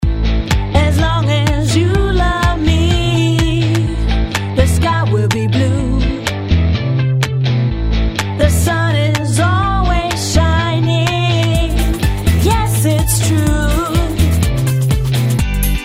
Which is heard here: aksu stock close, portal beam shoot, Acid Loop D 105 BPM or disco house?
disco house